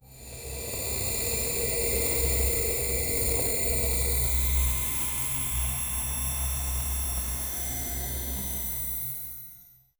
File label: magic particles